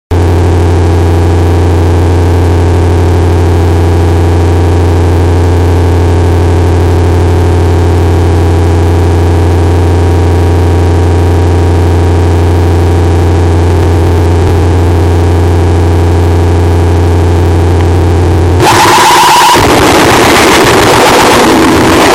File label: Morning Noise Broadcast